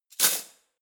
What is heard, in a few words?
cutlery spoons drawer place hard